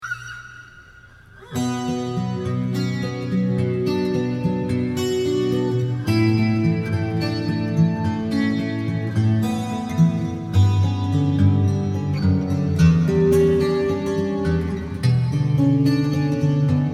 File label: Acoustic Etude